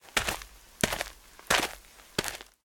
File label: footsteps soft